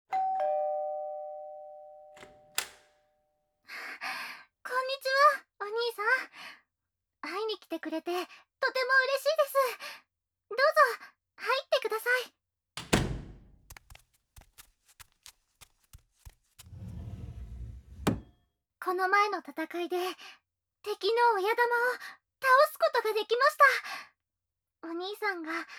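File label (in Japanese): ディープキス・爆音フェラ; 耳舐~攻め); アナル後背位; お掃除フェラ; 騎乗位(乳首舐め・ディープキス); 魔法少女の舐めまくりご奉仕・ラブラブ変態セックス; 絶対負けない魔法少女のイチャラブご奉仕; KU100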